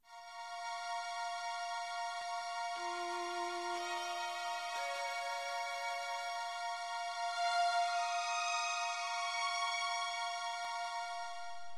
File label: Front Door Reveal; Rhiannon Curse of the Four Branches